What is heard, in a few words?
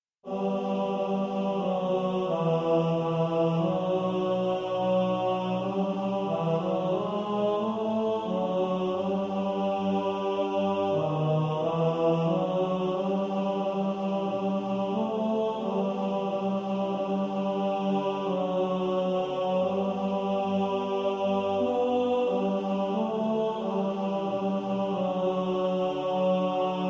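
tenor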